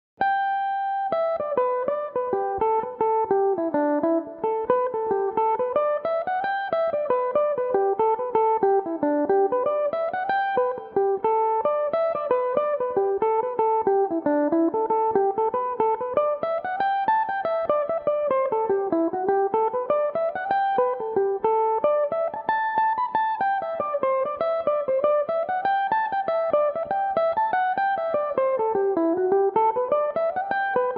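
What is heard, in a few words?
A dorian, irish mandolin, tunes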